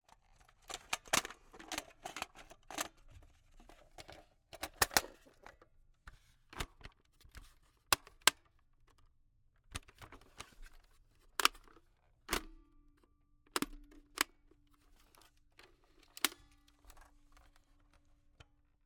Camera, Kodak EK2 Instant, polaroid, large, plastic, springs, replacing cartridge, rustle, clatter; Eiravaein Works; Vaeyan IV